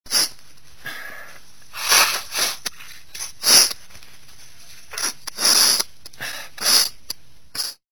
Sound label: sniffles